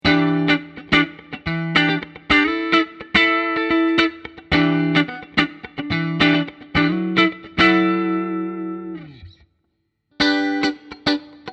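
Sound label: clean all funky, guitar pickups; schwarzbach; VP-Strat-IrishTour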